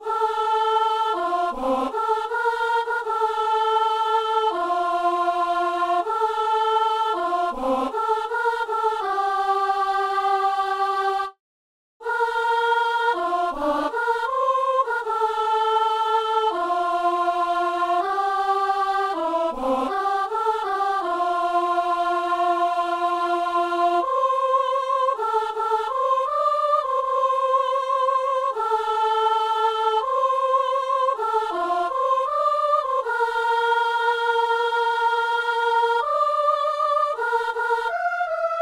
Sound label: soprano